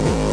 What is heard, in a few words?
obnoxious kick; Kicks